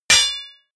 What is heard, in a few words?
block metal large